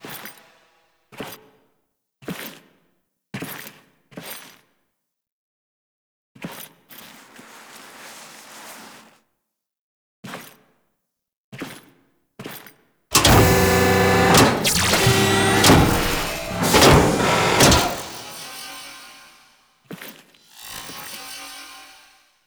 Intro Cinematic SFX; Alad V

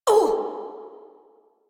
Pain, Barks; Warframe; Corpus Lich; Persona D

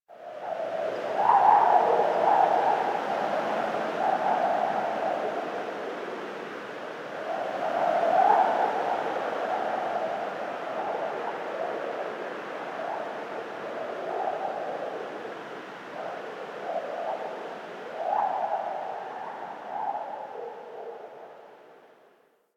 SFX Wind In; Warframe; The War Within; Mountain Pass Return Peak; Cinematics

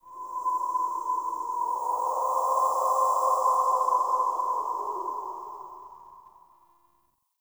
Ext Wind Death Howl; Warframe; Infested Eris

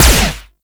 Flux Rifle Lens Shutter; Warframe; Laser Rifle; BE Flux Rifle

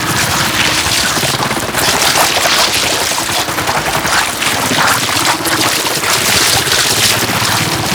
Ink Rifle Beam Loop, Weapons; Warframe; Tenno

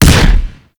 Double Barrel Shotgun Fire Shot; Warframe

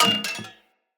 Hunter Bow Drop; Weapons; Enemy; Bows

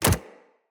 companion step default, footsteps; Pets; Zanuka

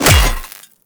Melee Hammer Impact Robotic Flesh; Warframe; Hammers

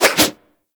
Axe Small Spin Swing; Warframe; Weapons; Swings

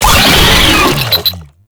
Player Spotted, Vocals; Warframe; Proto Infested; Brachiolyst

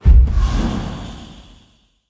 HUD Ability UI Ability Ready Three

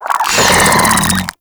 Desert Skate Vocal Attack; Warframe

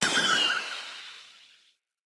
Bow Arrow By; Weapons; Josalfar Bow

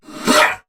Attack Effort; en; Warframe; Battle; Dax; DaxKnight

